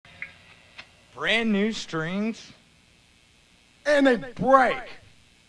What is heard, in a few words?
broken strings